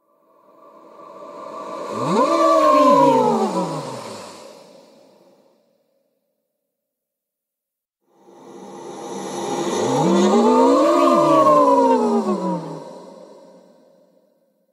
sonido de fantasma

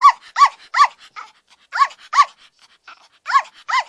Sonidos De Perro

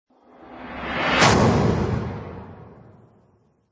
explosion zas

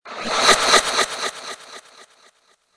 ecos misterio

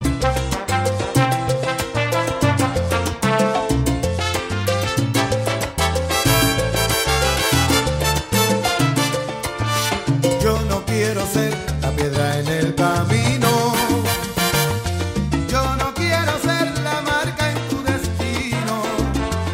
salsa

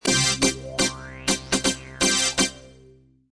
noticias

